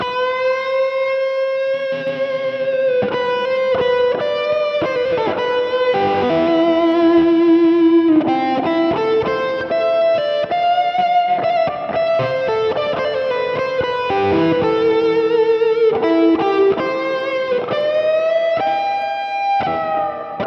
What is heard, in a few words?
stems Wet